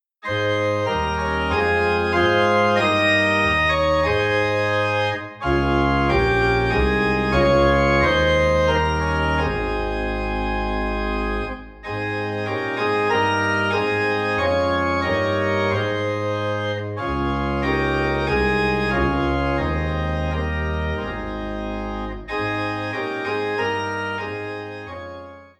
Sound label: Organ in A Flat; For The Beauty Of The Earth